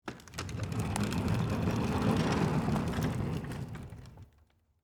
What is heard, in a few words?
Chalkboard Roll, Office, Chalkboard, Roll, Across, Floor, Slow, Short, Wheels, Classroom; Rolling Sound Effects; BlastWave